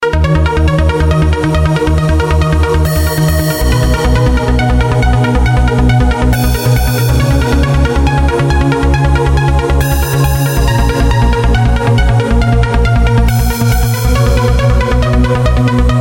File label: trance emotions